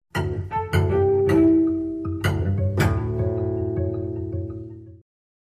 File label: Cello Suite 2 Variation 3